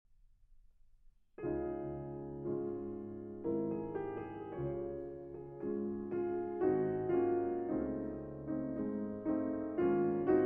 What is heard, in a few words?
Piano Sonata in B flat major - Andante sognando